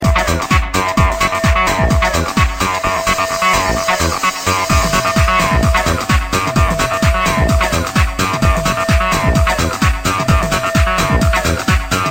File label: Circus Bells